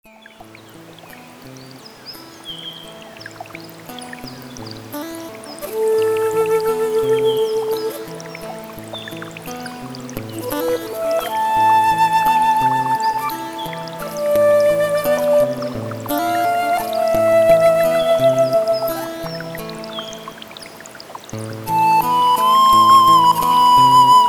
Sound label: analog orchestra flutes